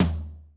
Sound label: tom, Roland MC-909